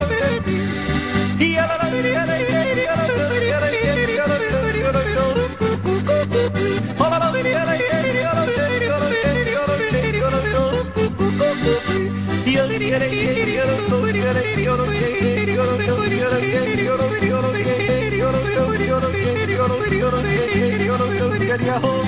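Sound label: best austrian ringtone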